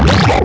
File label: hard robot boss